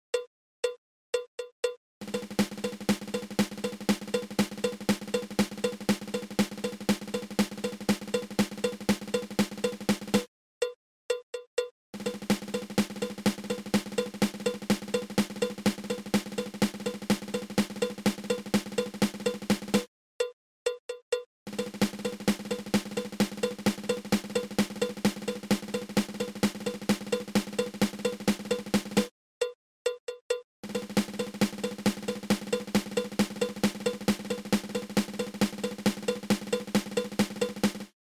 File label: Single Ratamacue